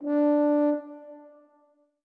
orbit hornsolo; Sky Children of the Light gamerip

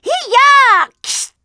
Ambient

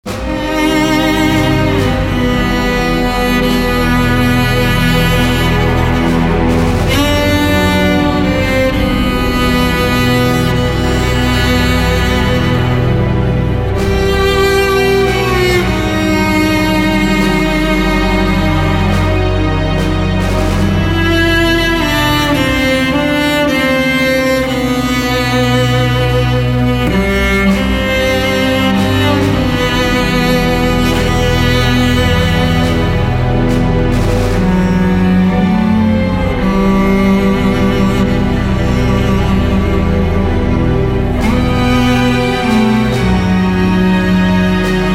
Failure; Myth 3: The Wolf Age

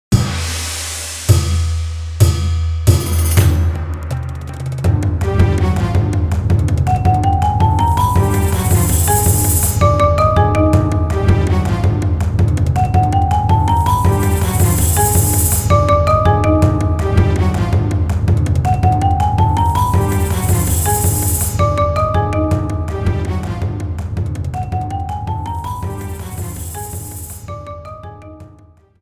Rolling Boulder Theme; the legend of zelda skyward sword wii gamerip 2011

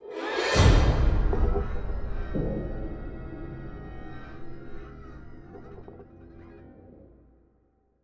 Shock Riser; Pineview Drive

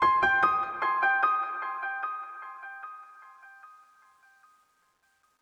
One shot Piano

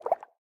Lagoon Water Bubbleburst; Zombie Catchers

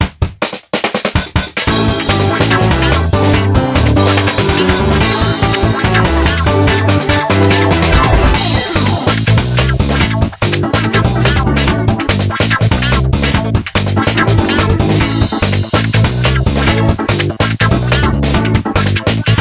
Happy Conversation BGM

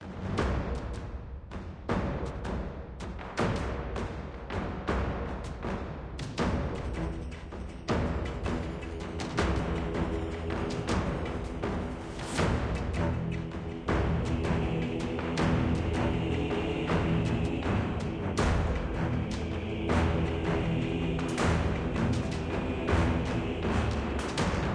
enemy move; devils demons android game music

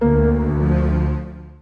Musician Rebloom Bass

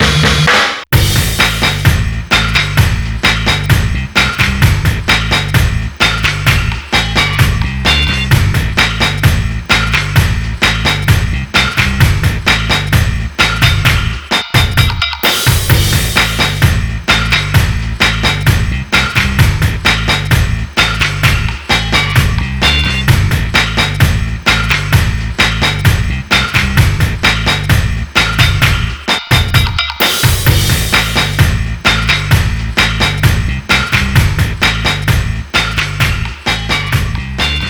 Jingle - Bad Luck; Super Monkey Ball Banana Splitz; PS Vita

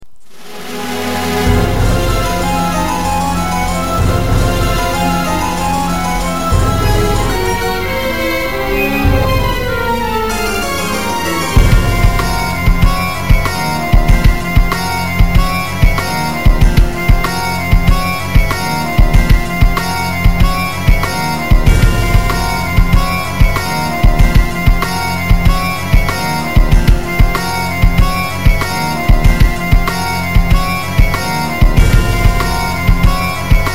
Countdown; Advance Wars Days of Ruin

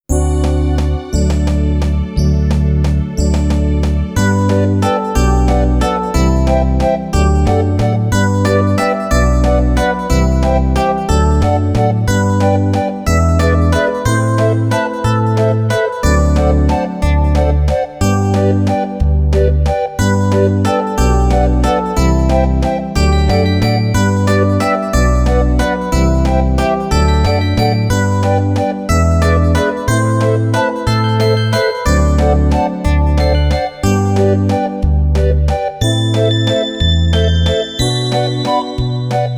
Synth Version; Tentama Sound Collection Alpha

